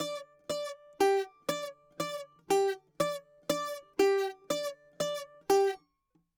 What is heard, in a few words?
bouzouki